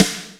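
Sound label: R8 snare drum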